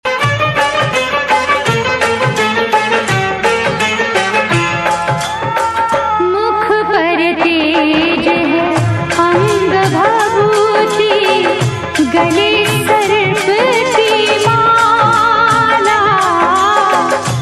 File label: Female Version